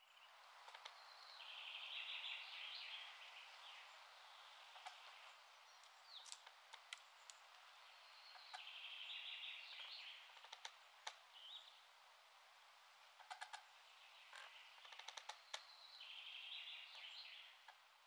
creaking tree, MidDay; FontainebleauDemo

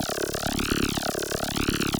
weapons beam loop, Laser; FX; Megacity

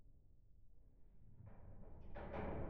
room amb low rumble; Ambient; SpaceshipDemo

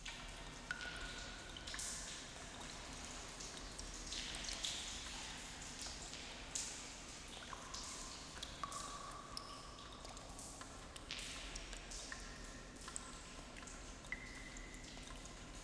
Water dripping; BossRoom

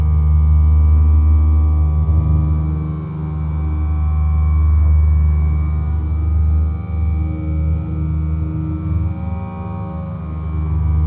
room fx machine loop; Ambient

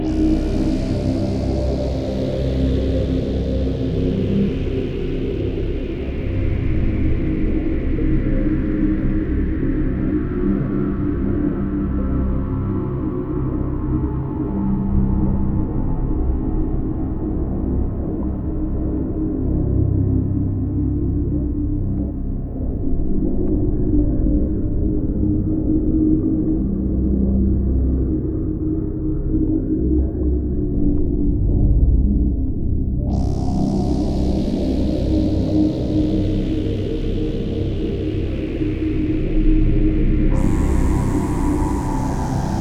In Game Music; DOTSSample

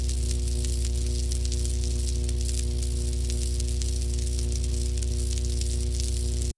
Electric Sign; Megacity

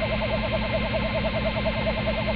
Hover Car; Cars; Megacity

